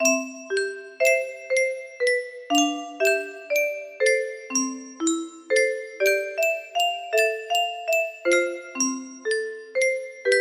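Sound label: music box melody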